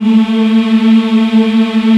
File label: African Mm f; Roland LCDP11 Africa VOL-1